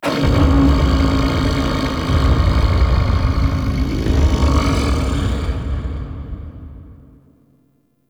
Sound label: Shaking Lab Rumble; Warframe; Entrati